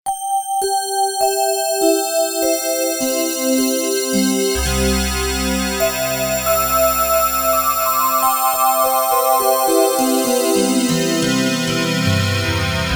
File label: Wave Bells; Factory Patches